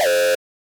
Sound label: MenuTimer tick; itgmania